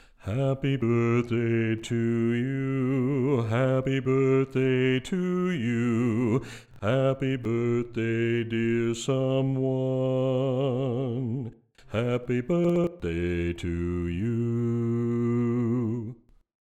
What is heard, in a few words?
Happy Birthday - Bass